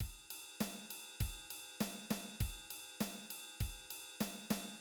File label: Shuffle feel straight